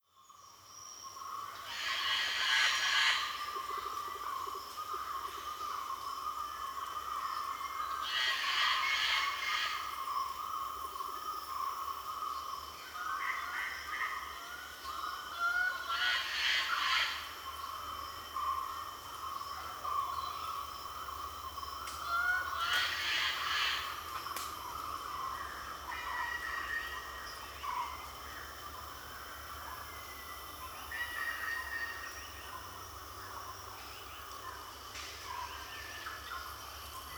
Jungle insects and birds passing wide the evening, South India; Charlie Atanasyan; Faunethic